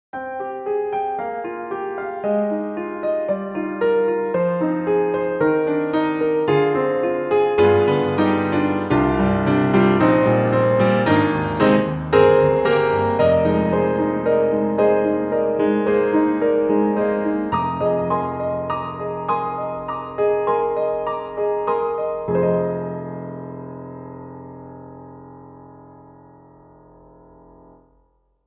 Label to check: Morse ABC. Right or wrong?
Wrong